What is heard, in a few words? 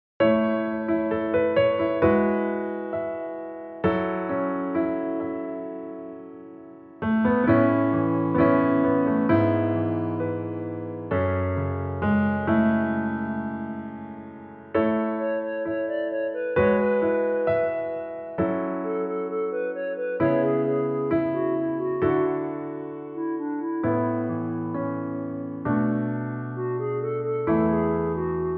Acoustic; 원키에서(-2)내린 멜로디 포함된 MR입니다.